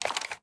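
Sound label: Movement Raise; Valorant; shorty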